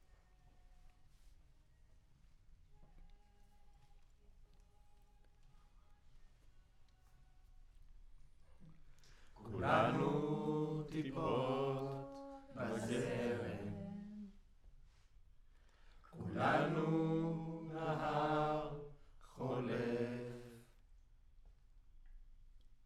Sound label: Choir